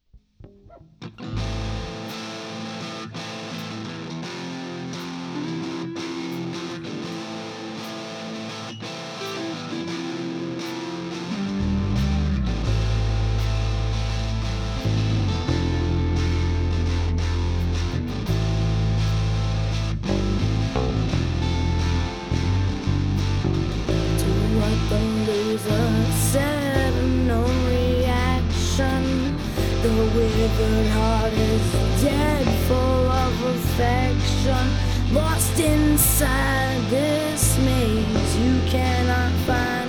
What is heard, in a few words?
Rain